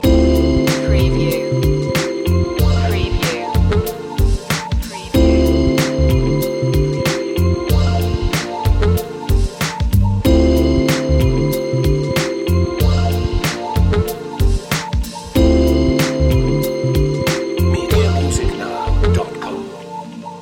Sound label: night tales loop